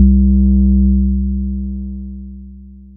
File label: Sub 808